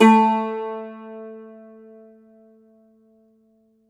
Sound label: Cumbus Saz